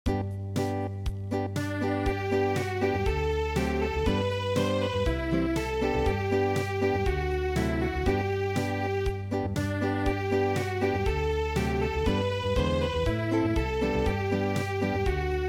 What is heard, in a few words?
Unplugged